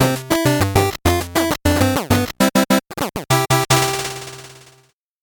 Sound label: 8bit jingle slow